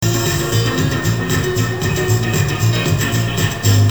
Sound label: depressing rock music